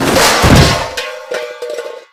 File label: ground pre; shakey